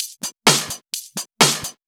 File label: Electro Loop 128 BPM; Vengeance Samples